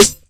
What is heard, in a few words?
Snare, drums; Ableton Live 12 Lite Core Library